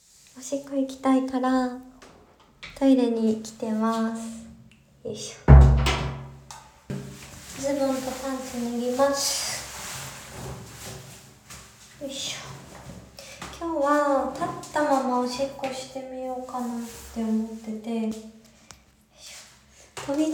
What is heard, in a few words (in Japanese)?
お風呂で 立ったまま オシッコです